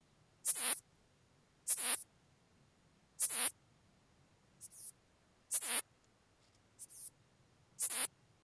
Ephippiger carlottae; BIOAC ORTH

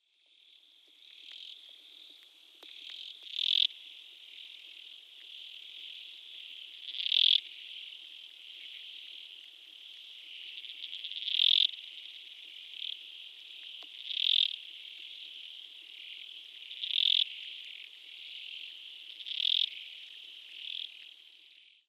Corixa sp

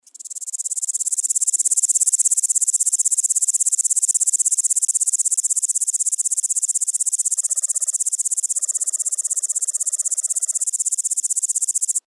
Tettigonia cantans; BIOAC ORTH

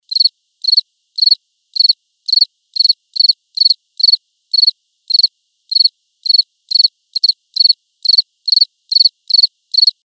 Gryllus campestris; BIOAC ORTH

